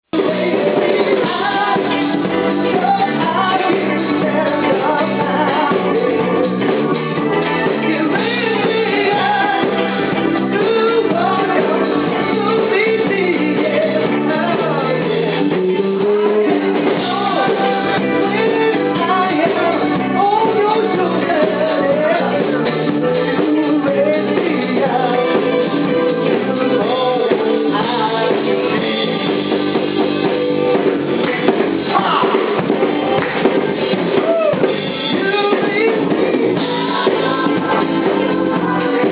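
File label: Fender P Bass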